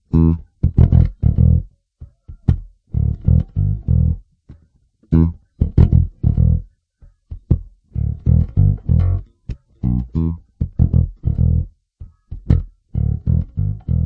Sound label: wah wah, BASS; melodic samples